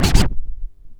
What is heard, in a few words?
ST SCRCH, SCRATCH